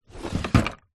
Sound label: office stationery drawer wooden close